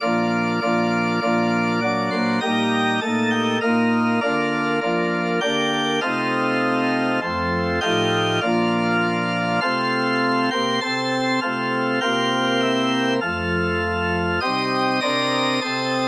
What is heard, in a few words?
ORG, organ